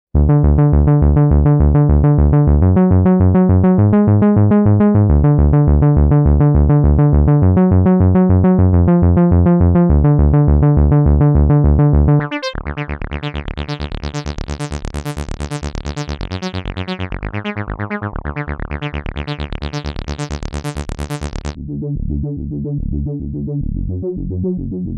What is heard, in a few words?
Arp Bass